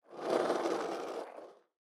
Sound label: loading